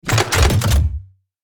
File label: Armored tank cannon loading